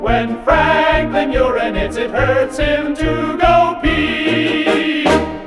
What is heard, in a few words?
urinate peepee